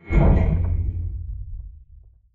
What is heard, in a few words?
structure crunch; Barotrauma; Subsurface; Damage